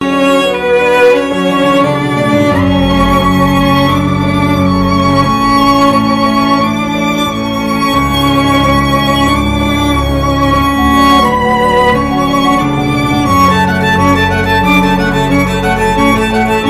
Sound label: violin